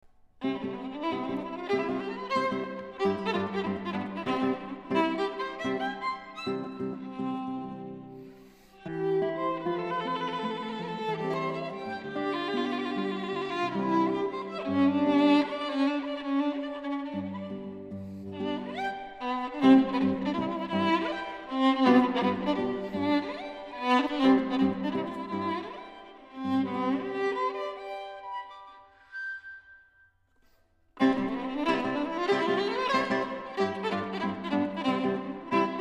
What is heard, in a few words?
for violin and guitar; paganini; variaciya15 barucaba soch14